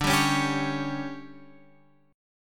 D Major 7th Suspended 4th, Guitar, Open F; DM7sus4; strummed; x,9,7,8,10,8

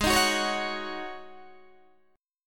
G sharp 7th Suspended 4th G sharp, Guitar DADGAD

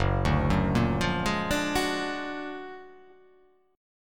F sharp Augmented Major 9th F sharp

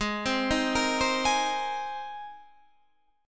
G sharp Major Add 9th, Guitar Standard; G#add9 arpeggiated; JGuitar

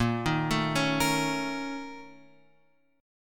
A sharp Minor Major 7th Double Flat 5th A sharp; A#mM7bb5 Chord {6 6 7 6 X 6} arpeggiated

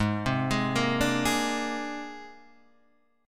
C 7th Suspended 2nd Sharp 5th G sharp

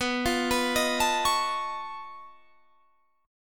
B 9th Flat 5th B down Guitar Standard; B9b5 arpeggiated; JGuitar

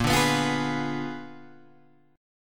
A sharp 7th Flat 9th A sharp down Guitar Open G

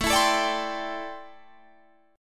A Minor 11th A Guitar Open G

